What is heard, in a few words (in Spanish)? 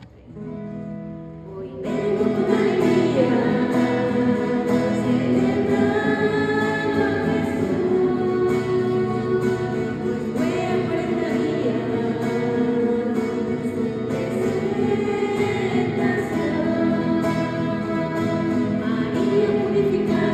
canto de la candelaria